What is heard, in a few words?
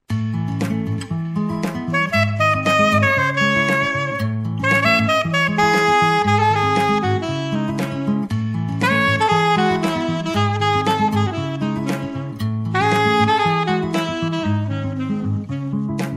Sax; Charts mit Sax Beispiel